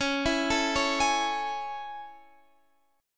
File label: A Major 7th Db